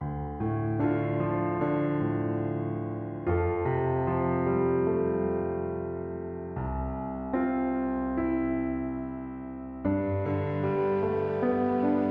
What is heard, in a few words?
epic trailer music